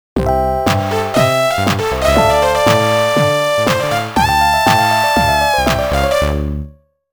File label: Fashion (Jingle); thats so raven psychic on the scene